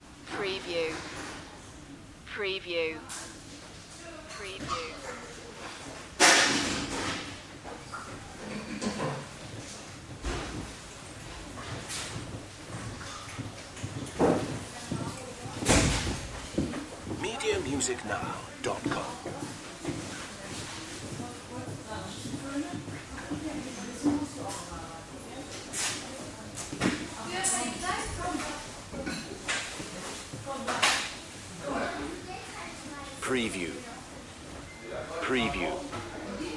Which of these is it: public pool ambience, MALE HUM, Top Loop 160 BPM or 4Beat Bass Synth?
public pool ambience